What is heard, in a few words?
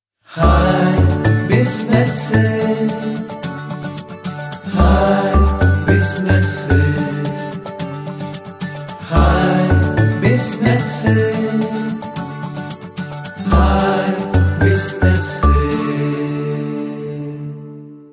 Theme Tone